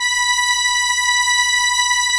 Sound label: STR ARP, ARP Strings, ARP Slow Str